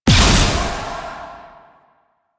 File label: Rifle Projectile Explosion; Weapons; Warframe; Duviri; Incarnon Adapters